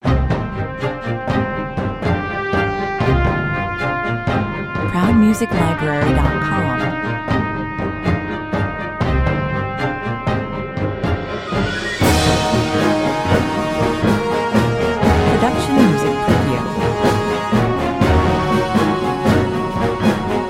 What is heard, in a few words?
barbarian army 80 bpm